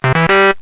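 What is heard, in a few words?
check click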